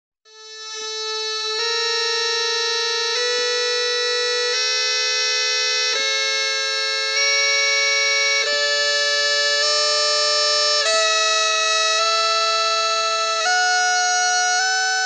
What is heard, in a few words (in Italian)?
launeddas